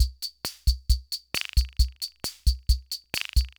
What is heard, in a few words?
Loop, sr-95; classic machines